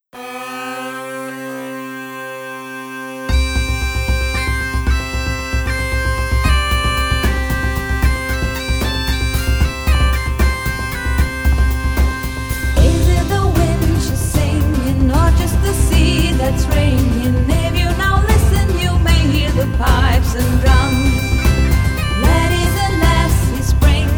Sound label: pipes